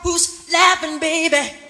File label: who's laughing baby SUNG; questions